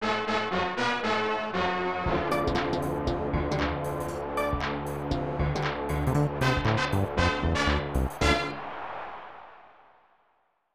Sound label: Baseball Jingle; Tecmo Super Baseball 1994 SNES